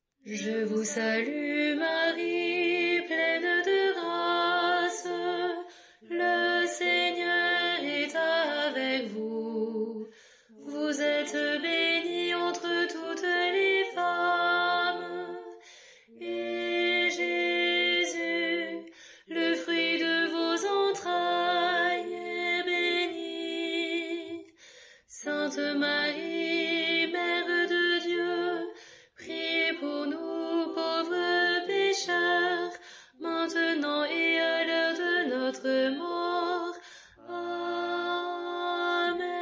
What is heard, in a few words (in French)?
je vous salue marie alto